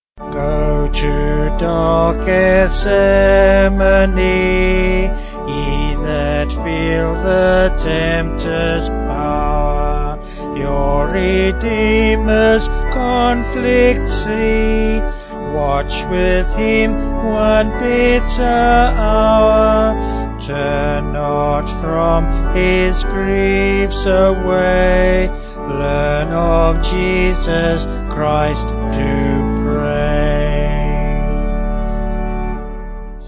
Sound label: Vocals Organ; Go To Dark Gethsemane; Redhead; 3/D Vocals and Organ